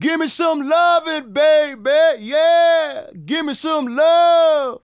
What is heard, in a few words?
Male Solo, Hip-Hop and RnB Vocals